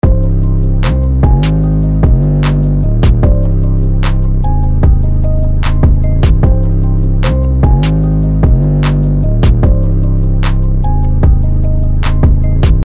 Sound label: messin wit 808